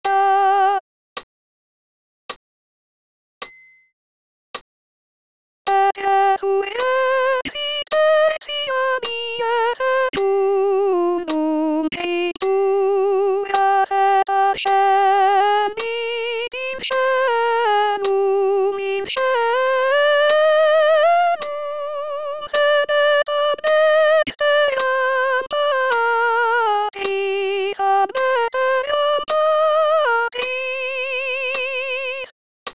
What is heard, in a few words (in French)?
Credo sop1 voc seul